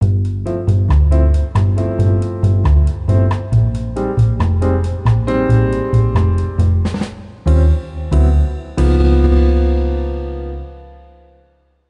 Bari Sax

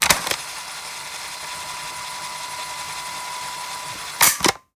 Ffwd Rewind

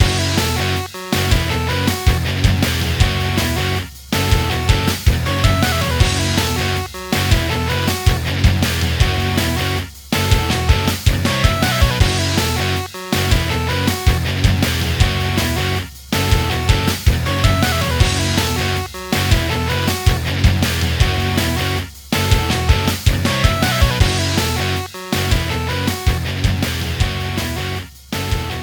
3d Music

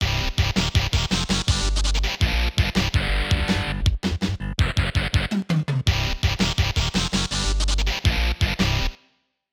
Match Win

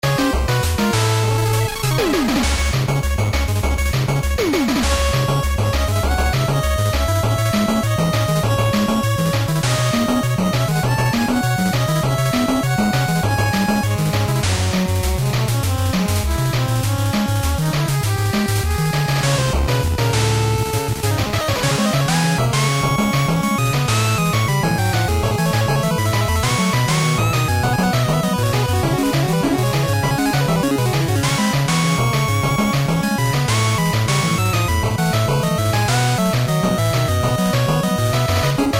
Fight; Mega Man Rock Force; PC-FX; Death Man Fight

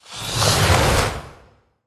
Demonic blast Cast; Hero Oloch

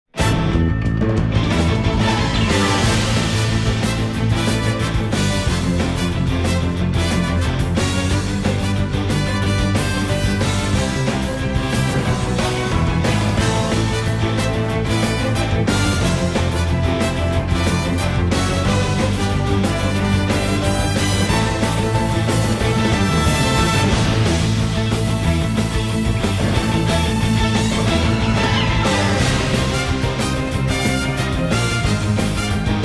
Conflict's Chime; bravely default ii

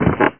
LEVER

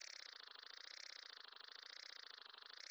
small hatcher fly loop

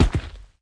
Footsteps; SFX Character

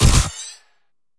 Sci-Fi Weapons Machine Gun Single Shot

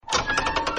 chandelier sound; Arc of Templar

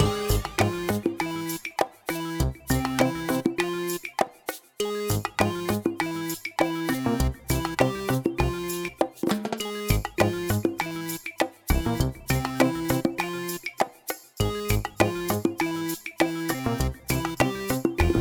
room holoboard music idle loop; Spacebase Startopia

